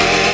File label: Synth Sound; Vengeance Samples; VEE Synth Shots